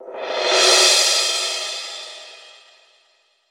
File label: large room cymbal sound sample d sharp key